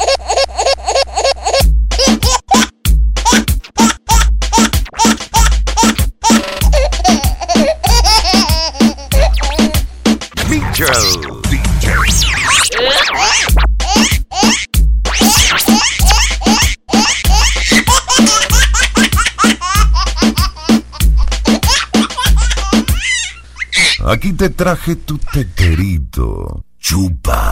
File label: baby funny